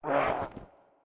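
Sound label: servant gargoyle damage; monster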